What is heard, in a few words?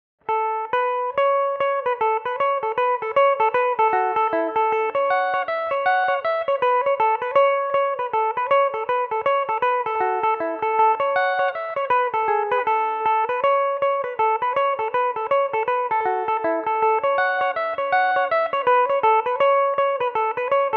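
reel A major, Irish mandolin; derry; belmuse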